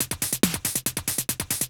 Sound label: TECHNO BEATS 140